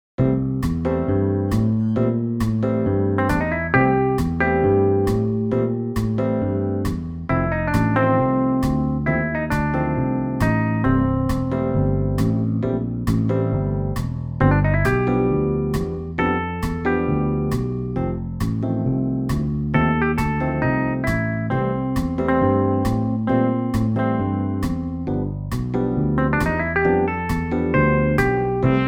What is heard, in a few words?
MIDI blues